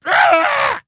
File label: Ghoul Death